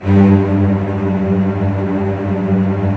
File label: STR VCS; Roland - LA Composer vol. 4